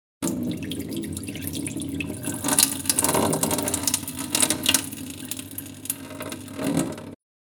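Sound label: pouring out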